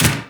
Door Slam

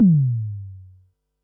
tom, Pearl SC-40 Toms

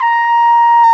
Solo Brass, Tpt p

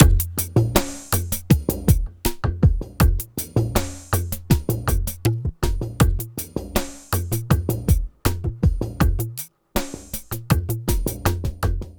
Ethnotronic, Processed Percussion

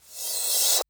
Reversed Cymbal, Yamaha EMR-1

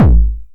909 BD Tape Sat

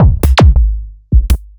Kicks

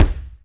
Kick, Alesis DM5

